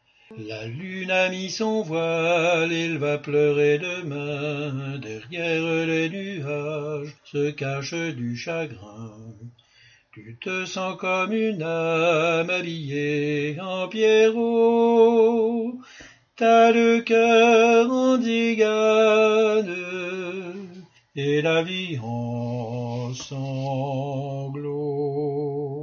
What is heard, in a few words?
alto